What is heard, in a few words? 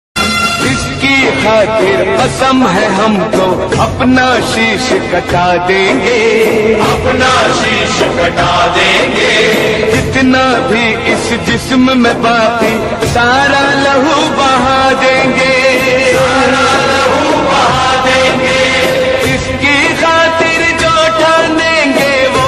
Republic Day Song Ringtone